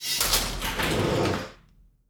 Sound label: cage appear